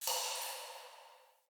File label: Cave Ocean Drip